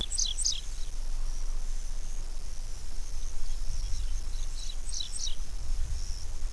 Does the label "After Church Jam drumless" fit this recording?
No